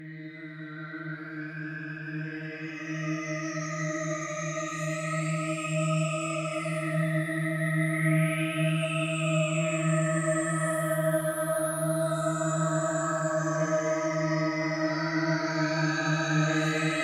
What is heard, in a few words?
639 Hz